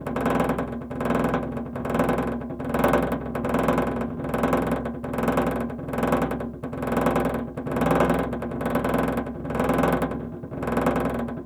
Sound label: Vibration, File cabinet, Steel, Rattle, Oscillate, Sluggish, Loop; Hzandbits